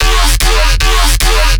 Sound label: bass loop 150 D#; doublemunch; Virtual Riot